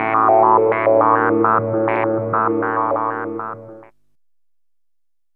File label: DR. LOOP, TRANCE; X-Static Goldmine 3